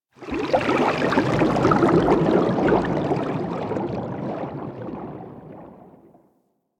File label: Sharkwing Movement Bubble, Vehicles; Warframe